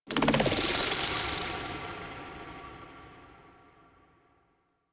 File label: Fragment Reveal; Cephalon; Warframe; Collectibles